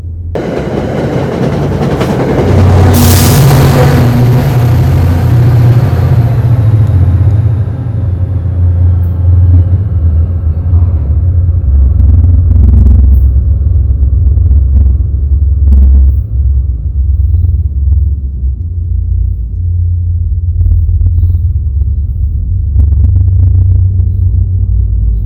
bergen west in growls; the bergen tunnels

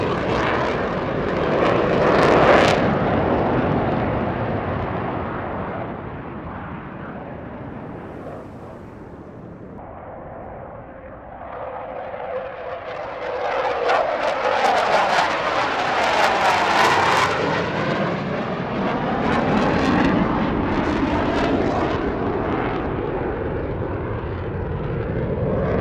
airshow, flight path over queens; lga

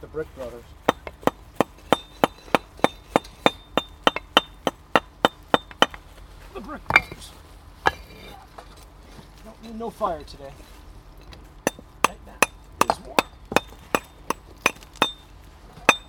brick taps

